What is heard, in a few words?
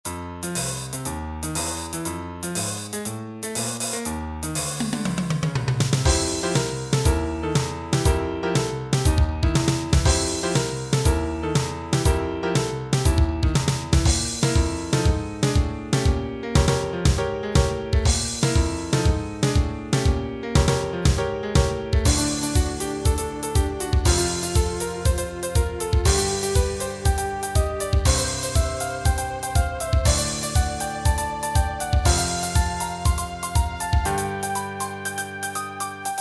drums